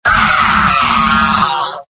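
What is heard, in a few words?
demoman pain critical death; mvm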